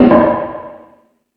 Industry, Drum Machines E-MU Pro-Cussion

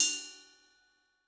Ride, Cymbal; Yamaha DTXpress-II

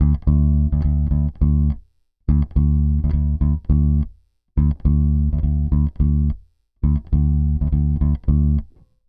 Rock, Bass; Bass X

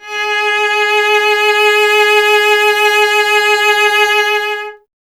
STR VC G#0, Cello; Miroslav Vitous; Solo Instruments VOL-1

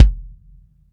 BD, Bassdrums, Roland TD-20

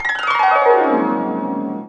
hp c#3, AKAI S-612 + S-900 Harp Gliss

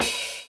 OH, Drums Dance & Groove; USB Soundscan vol.11; AKAI; NYORK KIT

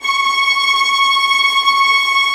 VIOLINT, Synclavier Strings; ILIO

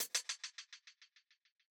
hat fx, 138bpm; Zero-G Sound Sense Grime Scene; Construction Kits; Da Suspects

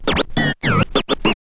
scratch kit, vinyl; Masterbits; Vinyl Attack; scratches

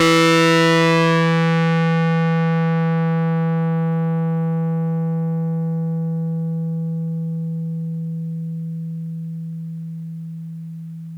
RHODES FUZZ

